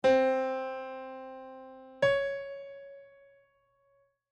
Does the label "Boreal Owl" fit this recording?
No